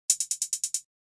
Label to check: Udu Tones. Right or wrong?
Wrong